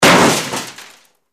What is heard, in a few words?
Bullet Impacts Metal